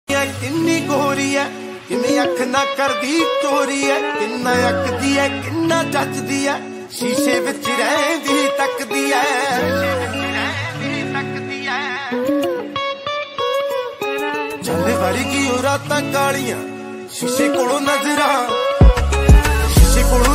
punjabi song ringtone; new punjabi song

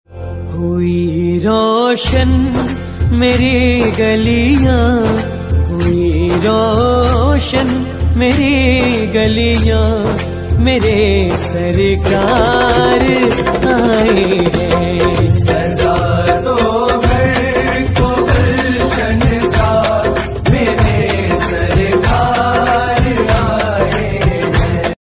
bhakti ringtone shiv shankar song bhajan; bholenath; bholeshankar

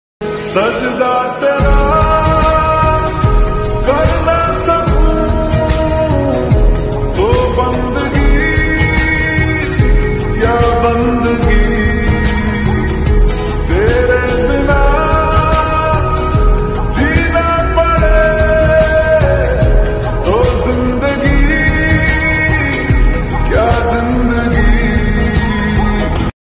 slowed reverb

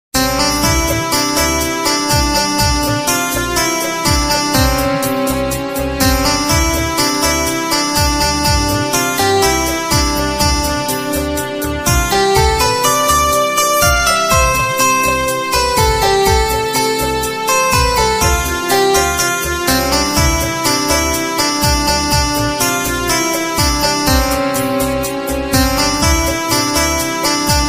instrumental ringtone

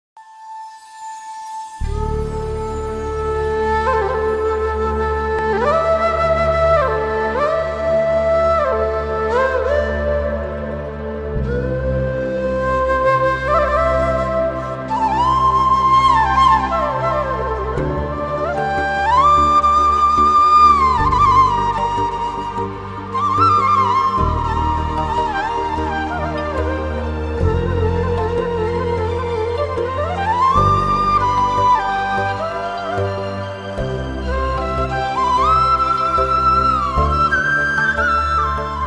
kanikonna flute bgm solo; vishu

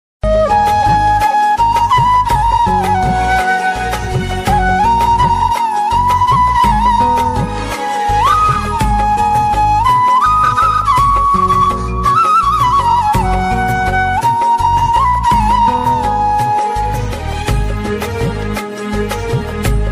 bhakti mobile ringtone; krishna ji

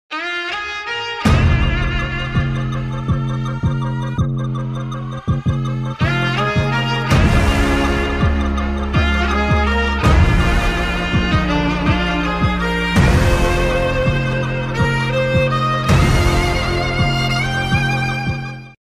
bgm ringtone telugu ringtone; kalki

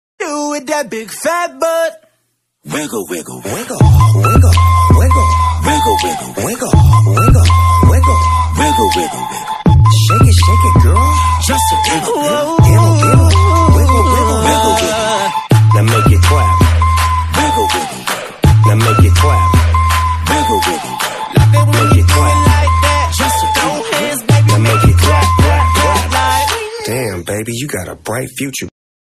ku ku ku ku ku ringtone

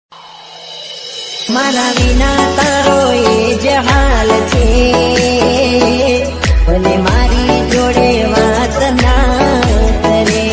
gujarati ringtone gujarati bewafa ringtone; gujarati bewafa status

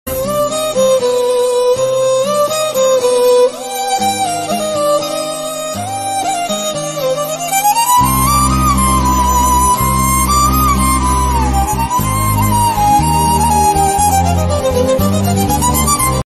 musical ringtone kannada movie

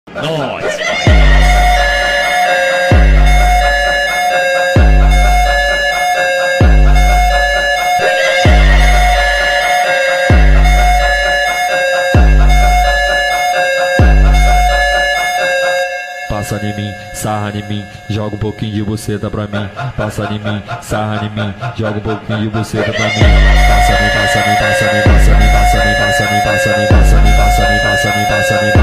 montagem beat

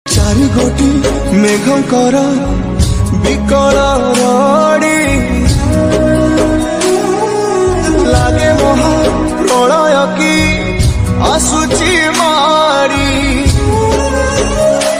odia bhajan ringtone lofi song

